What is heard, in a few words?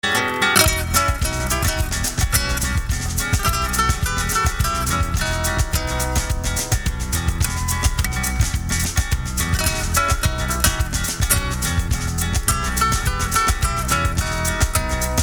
trance samba